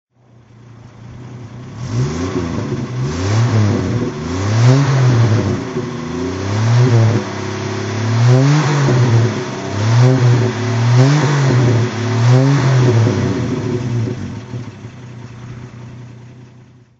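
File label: rover 95 p4 throttle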